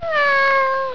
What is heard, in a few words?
meow